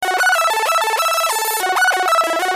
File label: Chip Tune